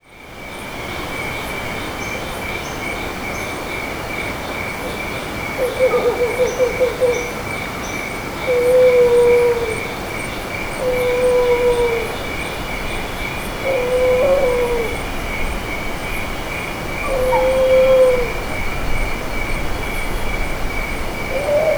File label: Strix leptogrammica, Bruine bosuil; uilen; Strigidae